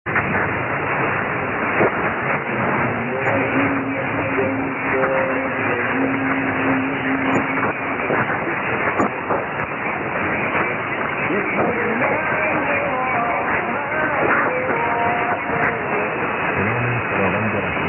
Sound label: Koran; 936 Iran